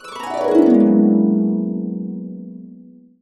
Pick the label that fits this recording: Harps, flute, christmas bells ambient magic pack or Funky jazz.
Harps